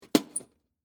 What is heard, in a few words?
Lunch Box Latch, Open, Metal, Case; Foley; Latches